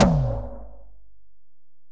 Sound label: Tom, Alesis DM-PRO